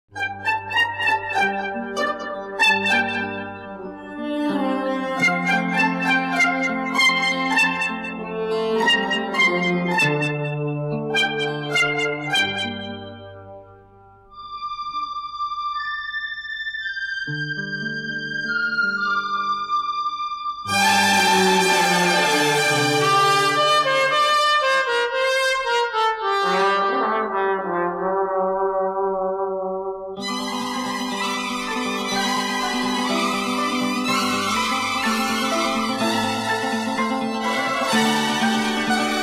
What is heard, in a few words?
Instrumental